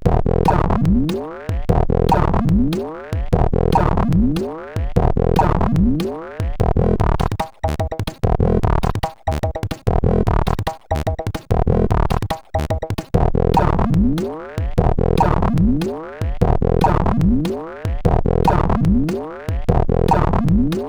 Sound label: Bouncy Funk sid CSG8580R5